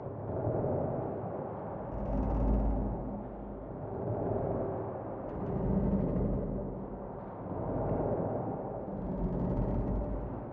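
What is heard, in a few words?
ambient ship waves interior loop; Skyrim Special Edition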